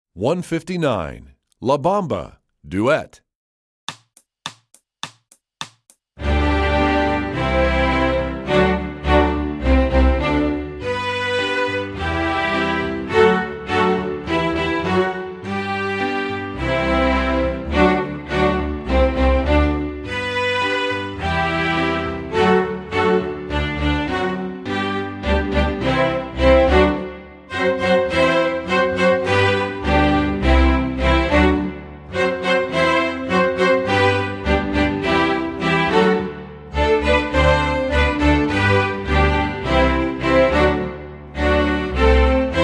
La Bamba Duet, Strings; EE2000 Book2

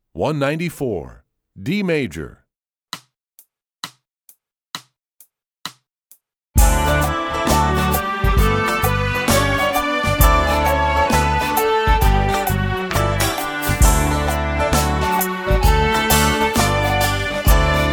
D Major, Strings; EE2000 Book2

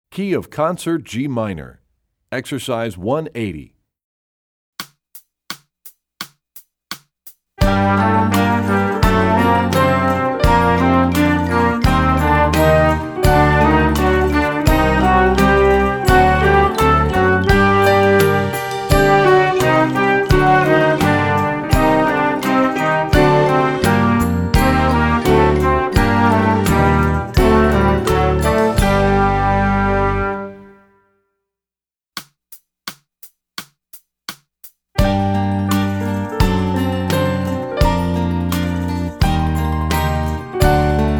Studies Concert G Minor; EE2000 Book2; Rubank

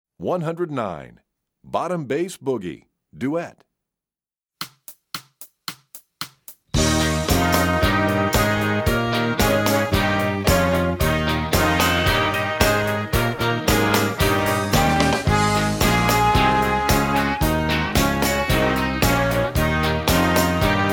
Bottom Bass; EE2000 Book1

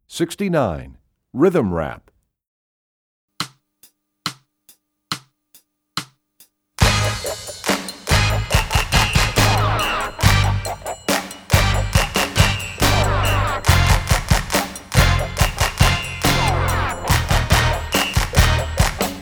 Rhythm Rap, Percussion; EE2000 Book2

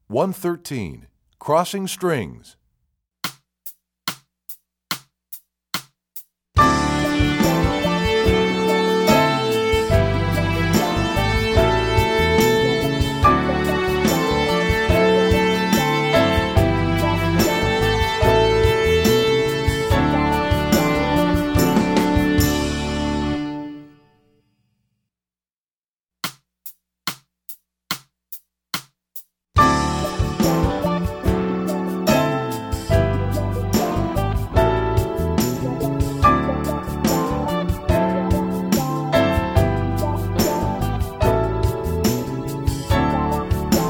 Crossing Strings, Strings; EE2000 Book1

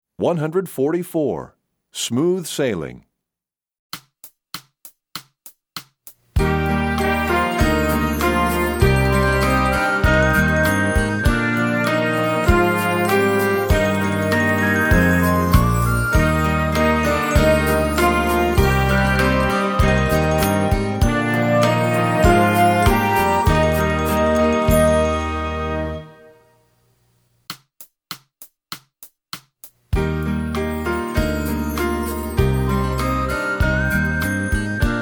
Smooth Sailing, Brass WW; EE2000 Book1

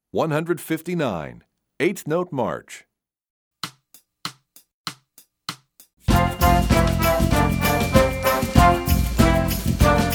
Eighth Note, Brass WW; EE2000 Book1

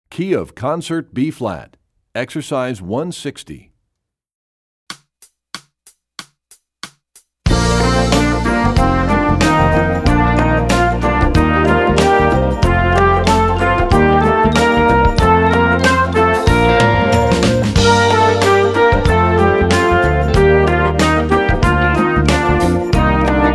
Studies Concert Bb; EE2000 Book2; Rubank

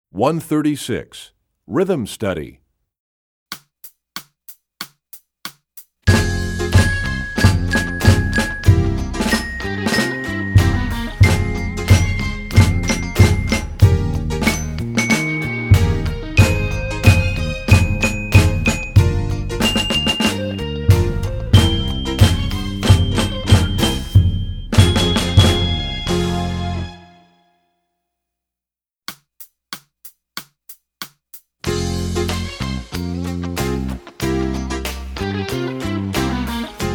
Rhythm Study, Percussion; EE2000 Book2

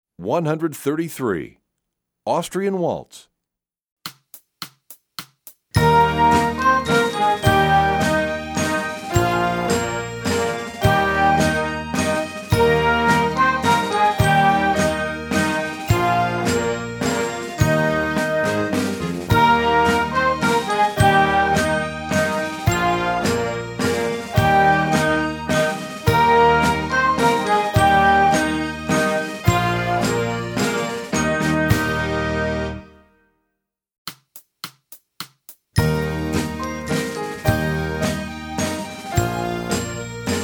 Austrian Waltz, Brass WW; EE2000 Book1

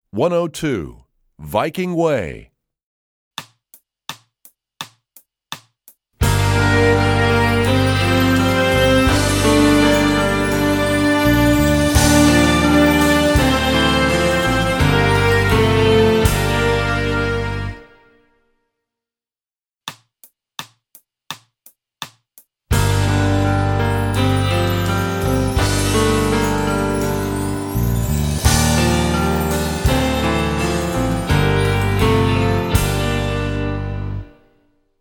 Viking Way, Strings; EE2000 Book2

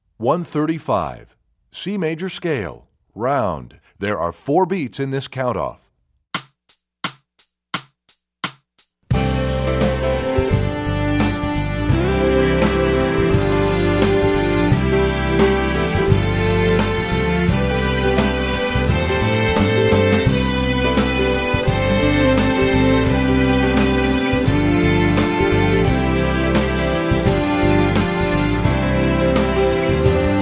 C Major Scale, strings; EE2000 Book1

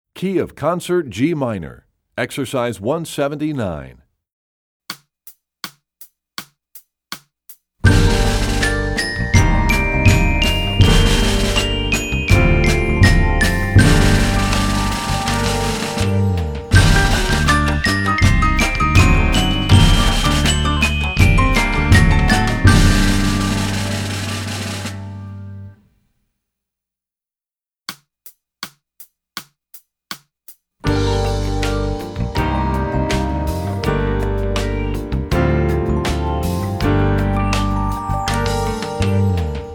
Studies Concert G Minor, Percussion; Rubank; EE2000 Book2

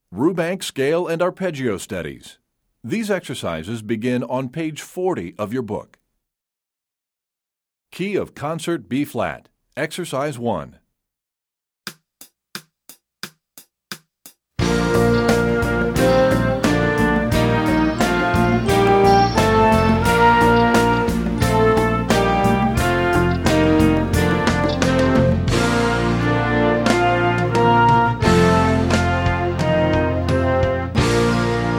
Concert B flat; EE2000 Book1

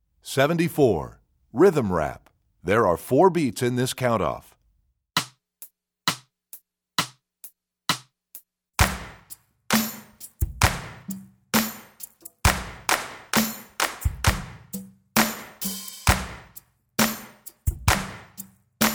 Rhythm Rap, Strings; EE2000 Book1

